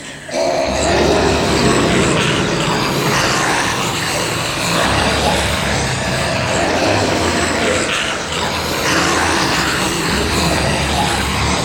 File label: Zombies